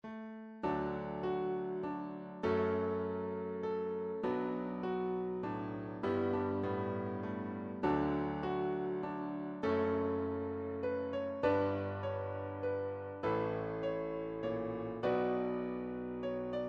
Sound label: Celtic Romance Piano